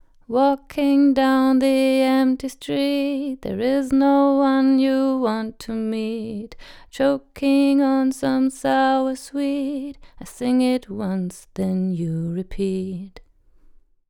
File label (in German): Female Vocal ohne EQ; Millennia NSEQ HF